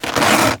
shuffle; SOMC Family Forum; match

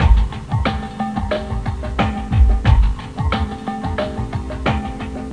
AmbiGroove, Loop Samples; Sonic Foundry

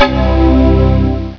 Startup; Musashi

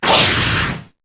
slider; Microsoft Graphics Studio Greetings; Microsoft 1998

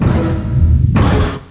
power

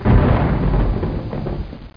clap

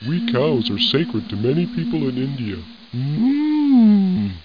cow talk

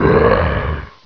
goblin jump roar; Ferazel's Wand Demo

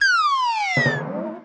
rabbit; The Interactive Alphabet

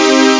insp chord

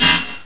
block, weapons sword; chaos

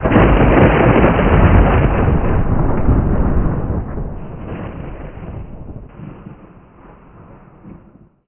thunder Crack

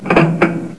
machine

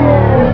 Shields DWN 11 kHz

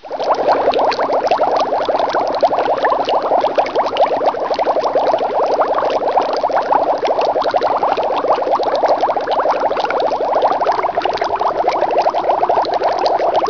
bubbles; Wayzata Technology

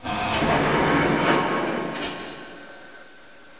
amb machine

